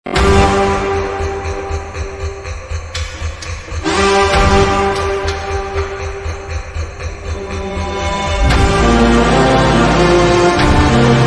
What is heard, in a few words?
turn slowed reverb